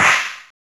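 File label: snare drum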